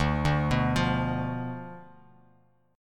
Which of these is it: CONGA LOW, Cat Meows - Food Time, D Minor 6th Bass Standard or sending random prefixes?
D Minor 6th Bass Standard